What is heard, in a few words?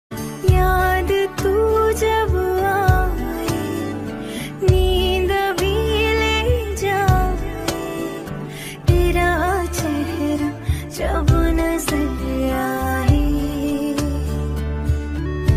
Female Version Ringtone